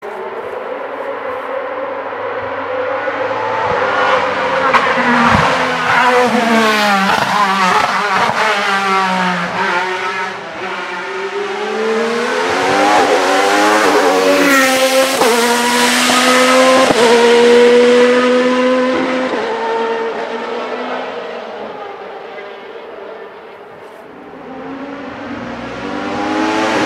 INSANELY LOUD Alfa Romeo 155 V6 Ti Screaming On Hill Climb; 1996